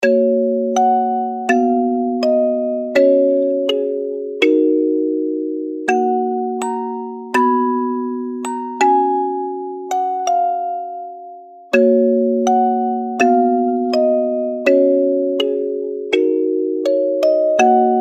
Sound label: musicbox x noise